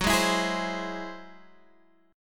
G sharp Augmented 7th

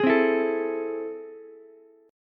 A Minor Major 7th, down, Ukulele; strummed; AmM7; JGuitar

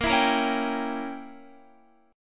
A sharp Diminished 7th, Guitar; strummed; chordshape

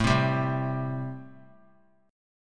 A-Minor 7th A; Am7 strummed; JGuitar

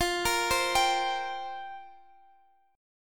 F Suspended 2nd Suspended 4th, down Guitar Drop D

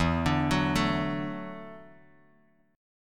E 9th Suspended 4th E down Bass Standard; E9sus4 arpeggiated; JGuitar

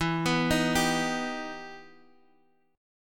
E Minor Major 7th, Guitar, DADGAD; 14,14,13,12,x,x; down

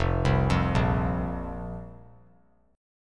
F Suspended 4th F; Fsus4 arpeggiated; JGuitar

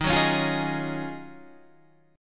Eb Minor 11th Guitar Standard, Ebm11 chord; strummed; JGuitar

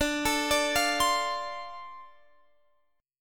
D-Minor 7th D, chord

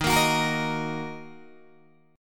D sharp Minor D sharp down Guitar DADGAD

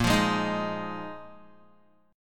Bb 7th Suspended 4th Bb Guitar 4E,4A,5D,5G,5C,6F

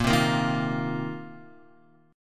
Bb Suspended 2nd Suspended 4th Bb down Guitar; Bbsus2sus4 strummed

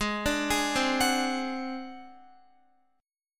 Ab 7th Flat 5th Guitar, chord; Ab7b5 arpeggiated; JGuitar

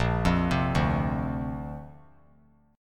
Bb Major Add 9th, Bb, down; arpeggiated; JGuitar

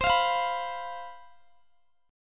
C Major 7th Suspended 4th, Guitar DADGAD

